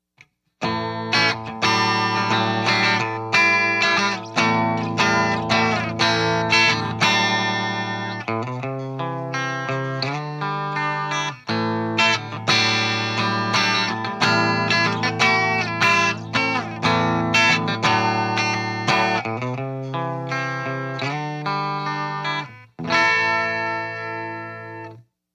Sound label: 50w plexi clean; m75